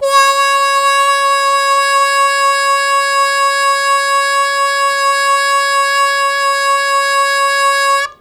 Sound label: Long Sustain Fast Wah Wah C#2, Harmonica; Zero G - Harmonica